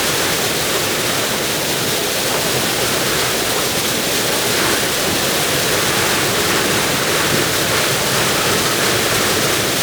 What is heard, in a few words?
Uh-1H Whistle Wind; Aircrafts; DCS